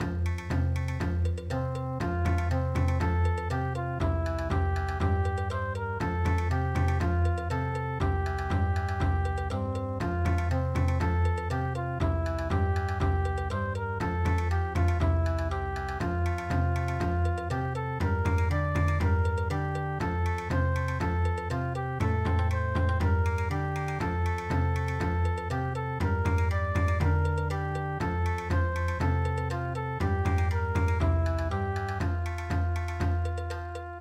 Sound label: Bon Odori, midi; Happy Time Has Come